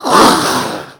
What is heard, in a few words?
hurt, zombie